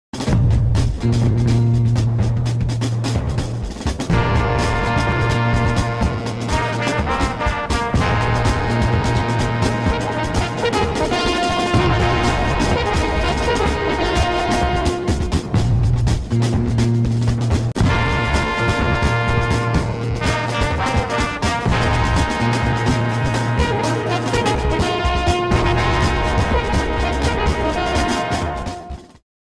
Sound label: Commercial Music; Kenner